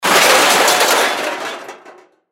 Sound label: Crash Metal Debris Small; Debris (Sound Effects:Materials); Blastwave